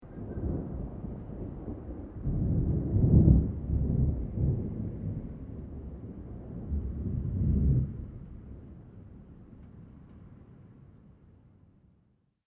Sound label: THUNDER DISTANT LOW LONG; Elemental weather effects